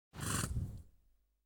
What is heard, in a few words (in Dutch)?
Paard Gesnuif; KMP Film Video Sound Effects